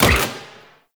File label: Rifle Fire; Warframe; Venus; Venus Elite Spaceman Leader; Supra